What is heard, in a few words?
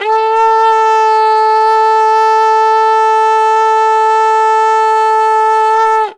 RkangDung A4, Winds; Polyend; Simulacrum Sounds; 1 Shots; Acoustic and Electric